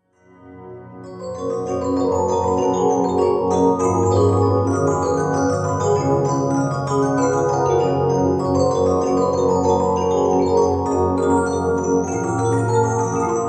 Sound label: Waltz From Die Fleidermaus